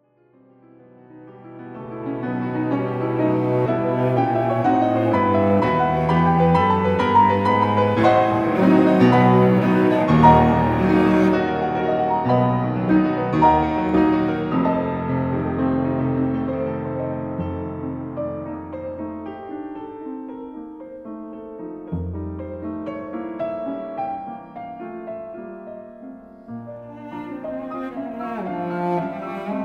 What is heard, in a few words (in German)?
Cellosonate